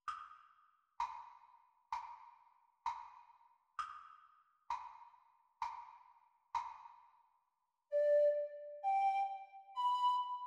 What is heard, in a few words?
Descant Recorder Practise speed